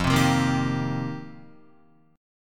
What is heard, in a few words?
F 7th Suspended 2nd Suspended 4th, Guitar; F7sus2sus4; strummed; JGuitar